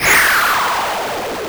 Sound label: falling